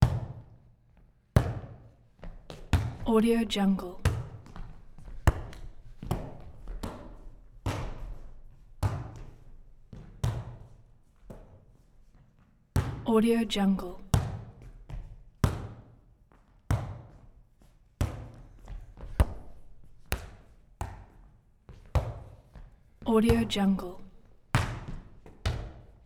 sports volleyball volleyball pass skin; human sounds

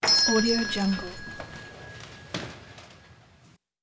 small shop door bell ringing; urban sounds

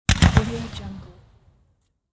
musket rifle fire; transitions and movement

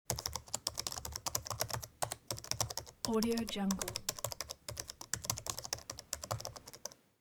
keyboard; فضای داخلی تایپ کردن

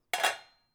cooking pot; domestic sounds

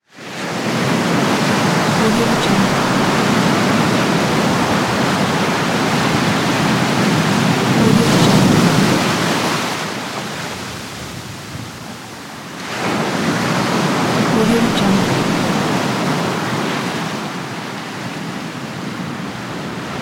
sea shore waves; خروش امواج دریا در ساحل

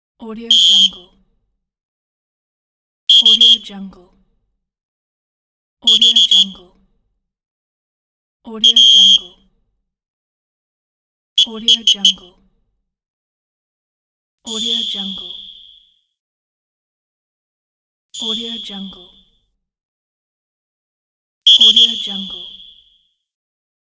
referee whistle sound effects pack

